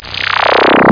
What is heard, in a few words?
RIPPLER, ELECTRON; Easy Personal Web Page